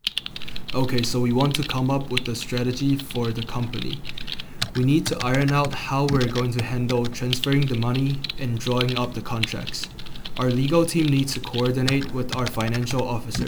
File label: keyboard Feature OFF